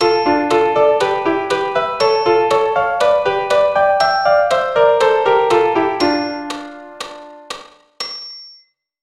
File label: Dm melodic